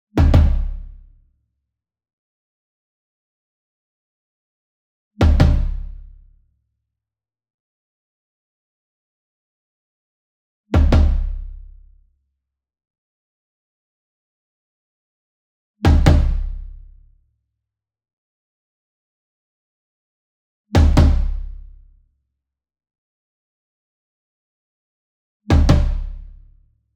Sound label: SINGLE DRUM Tom Concert Double Soft Beater Near; boom library; Cinematic Strikes Construction Kit